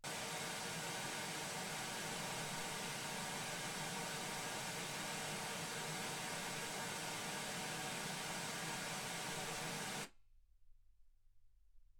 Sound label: wgn 48kHz